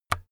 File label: keyboard external key release